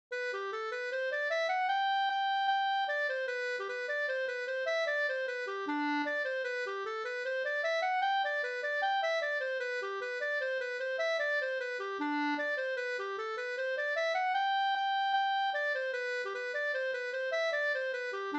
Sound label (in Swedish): engelska